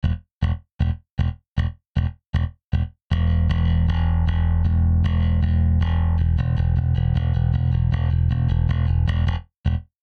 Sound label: Bass DI Drive; Cenzo Townshend